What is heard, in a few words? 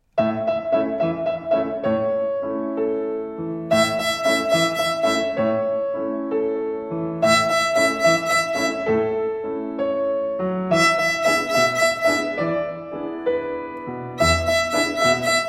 Rhythm on E, Twinkle Rhythm